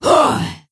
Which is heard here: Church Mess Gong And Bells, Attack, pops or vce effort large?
Attack